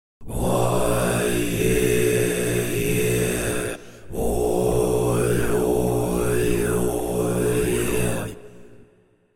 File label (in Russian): budiiskie zvuki penie muzhskoi mnozhestvennoii